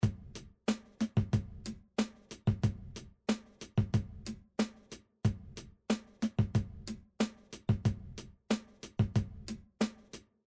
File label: Loop